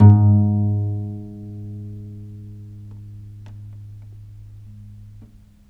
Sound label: VIOLE PIZZ G#1; Historical Instruments; USB Soundscan vol.23; AKAI